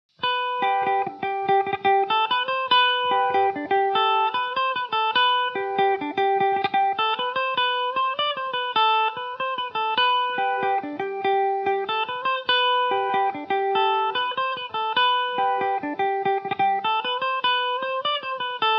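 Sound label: G major revelation, irish mandolin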